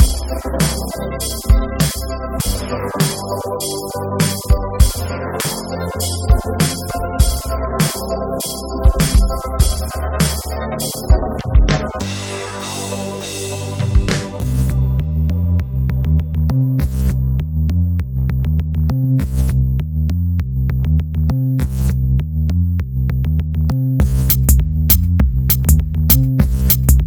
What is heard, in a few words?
Soft Heavy House